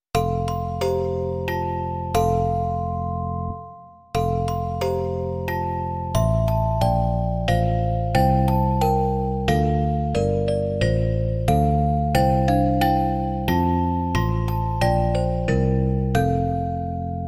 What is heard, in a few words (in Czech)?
celesta a basová kytara; Služebníci, chvalte Boha přesvatého; Imaginativní verze